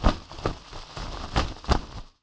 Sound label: sails, sea; AMBIENT; Pirates Of The Caribbean